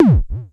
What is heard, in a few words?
KLONE WOO KICK, Klone Dual-Percussion-Synthesiser; Drum Machines